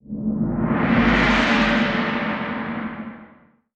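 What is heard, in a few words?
sting, cymbal roll; transformers war for cybertron gamerip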